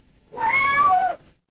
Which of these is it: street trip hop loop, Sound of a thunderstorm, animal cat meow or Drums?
animal cat meow